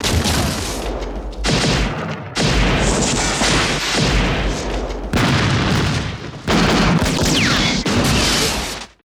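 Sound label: laser gun; Total Control - Action Cutz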